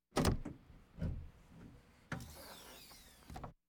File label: Automobiles various